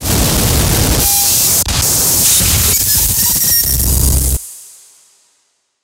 sonar Distortion; Barotrauma; Real Sonar

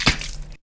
wet splat; Impacts; Thalassophobia Restored

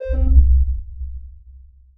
sonar Ping Air Close Beacon; Barotrauma; Real Sonar

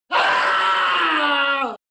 scream; Barotraumatic

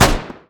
chaingun fire near; Weapons; EK modern; Barotrauma

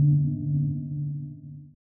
sonar Tail Air Far; Barotrauma; Real Sonar

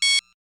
warning small; Electricity; EK modern

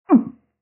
female drown; Barotrauma; Real Sonar

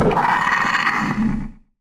attack; Barotraumatic; Crook

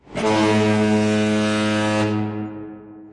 bus horn; Barotrauma; Barotraumatic

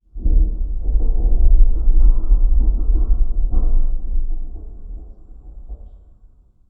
Glacial Movement; Barotrauma; Room FX; Ambient

